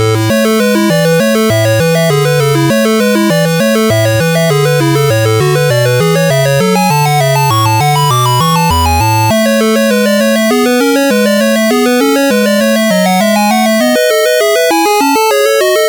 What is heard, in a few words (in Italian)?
sonata in la toccata per clavicembalo